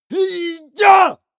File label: male combat attack long